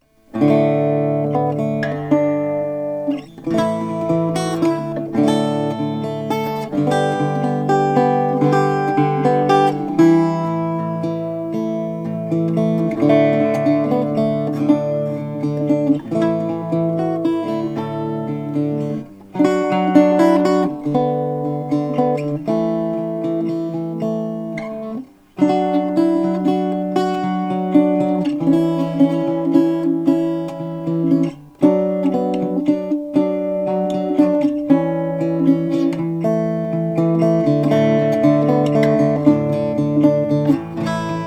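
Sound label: moon scotland master octave mandola flame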